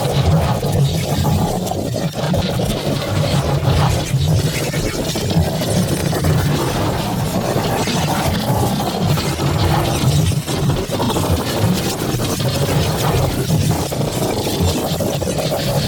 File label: Pulse Projectile Range Enhanced Loop; Warframe; Gyre